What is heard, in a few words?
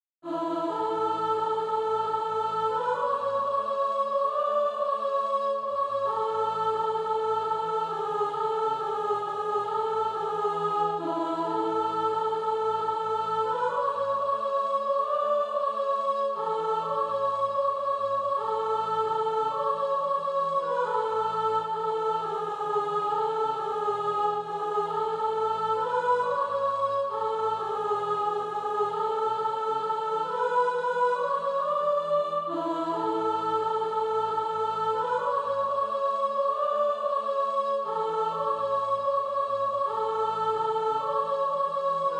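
alto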